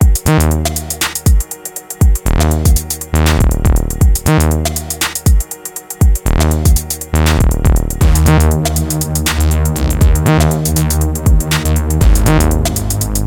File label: Bassomatic; MASCHINE MK3